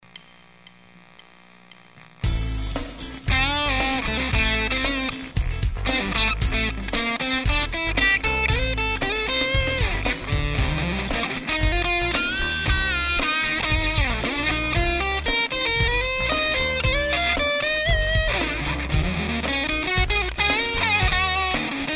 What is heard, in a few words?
Mini Fuzz Strato mid